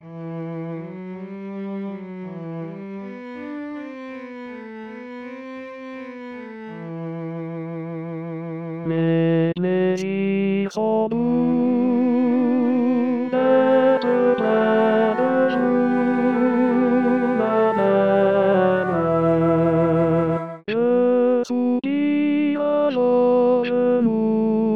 tenor

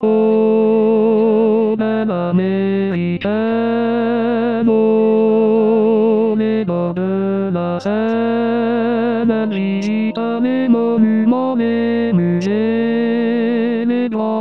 tenor

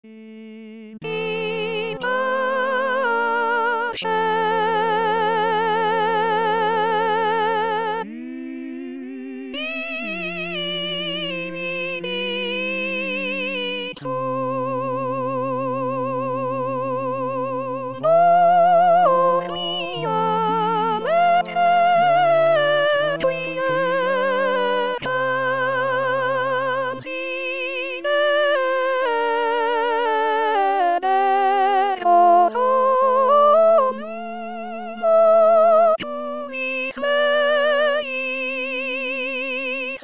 in pace soprano; 2023 st jean

